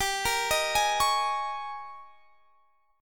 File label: G sharp Major 7th Suspended 2nd Suspended 4th